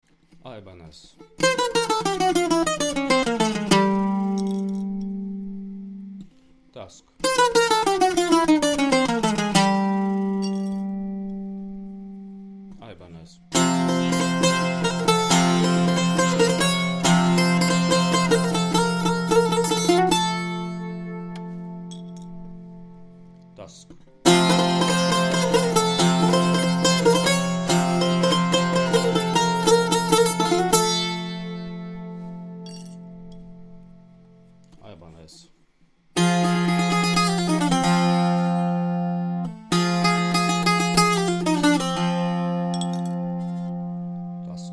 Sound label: Tusq pick test